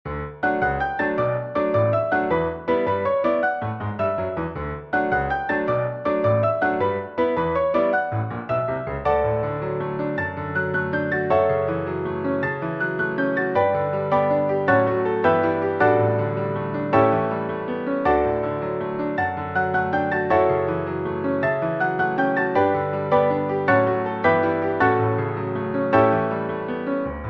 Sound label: Piano Duet